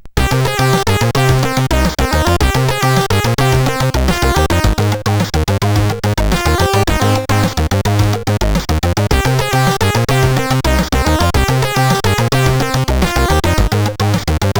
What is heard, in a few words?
Driver Test, CSG8580